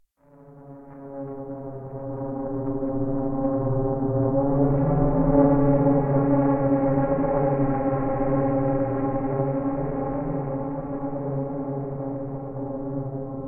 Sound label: Combat Mixed